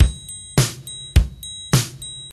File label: 104 bpm 90s breakbeat sample B key, Free drum beat - kick tuned to the B note.